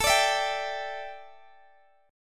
A 7th Suspended 4th Guitar DADGAD, chord; x,x,19,19,19,17

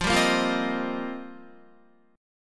F 7th Suspended 2nd Suspended 4th, Guitar

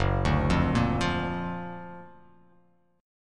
F sharp Augmented Major 7th, down

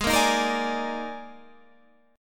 Eb Major 7th Suspended 4th

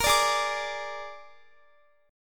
Ab Suspended 2nd Flat 5th Ab, Guitar; Absus2b5; strummed; chordshape; x,x,22,19,20,22

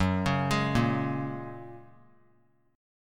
Gb Major Add 9th, down; arpeggiated; JGuitar

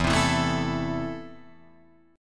E Suspended 4th Sharp 5th, Guitar Drop D; 2,3,2,2,5,5

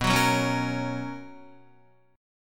B Major 7th Suspended 2nd Guitar, chord; BM7sus2; strummed; JGuitar

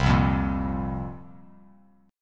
C 7th Suspended 4th, Bass Standard; C7sus4 strummed

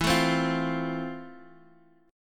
F Major, guitar chord

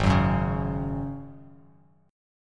G sharp 7th Suspended 4th, Bass Standard; G#7sus4 strummed; 4,9,4,6; JGuitar

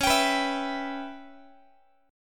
C sharp Minor 7th Flat 5th C sharp Guitar; C#m7b5 strummed; x,21,22,21,20,x; JGuitar

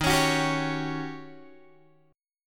Eb 7th Sharp 9th, Eb, Guitar, Open G; strummed

